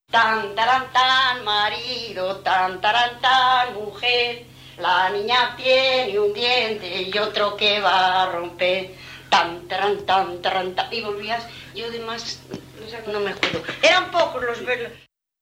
Tejido de cintas de Penaflor de Hornija

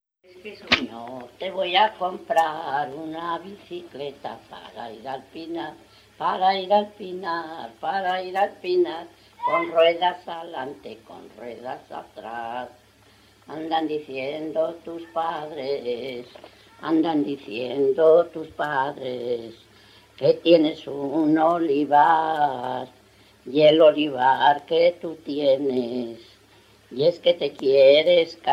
Jota, Campaspero

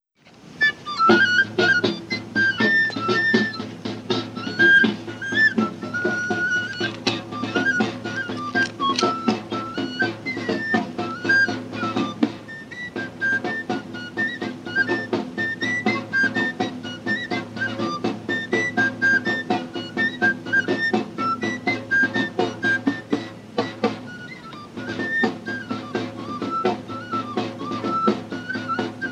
Jota de flauta y tamboril

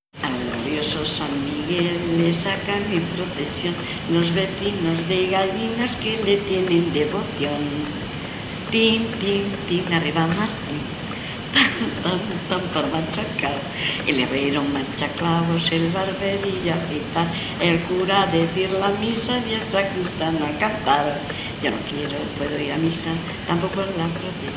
Paloteo de Pozal de Gallinas

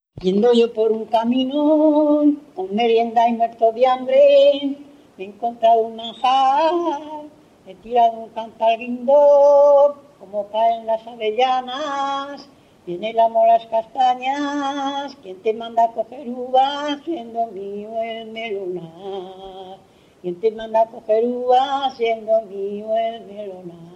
Jota, Arrabal de Portillo; Jota "Arrabal de Portillo y arrodíllate, majo, y haz una ese"

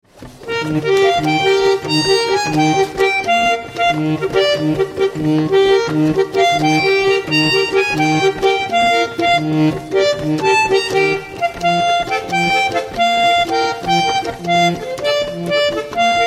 serinette

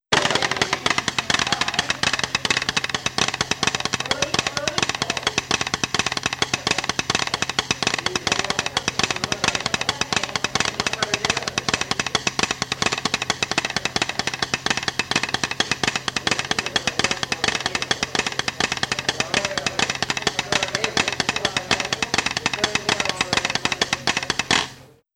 Ritmo de la entradilla

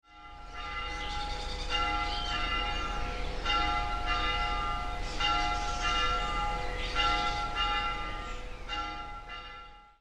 campanas, avila

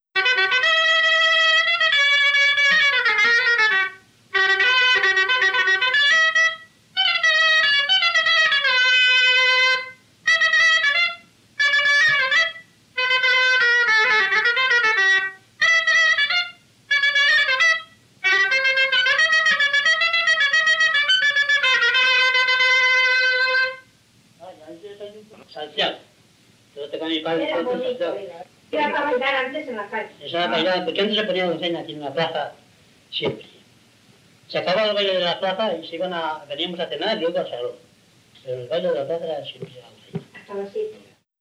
El salteado Baile corrido

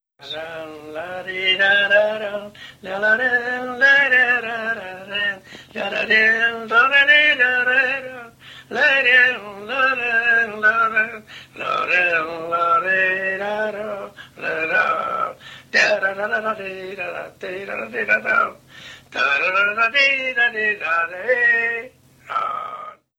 Danzas de Valoria La Buena

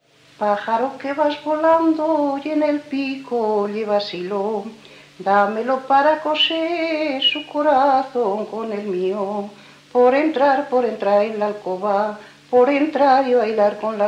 Jota Olmedo

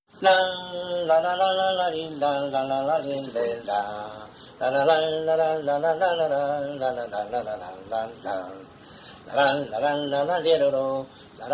Pasacalles; Pasacalles de Villafrades de Campos

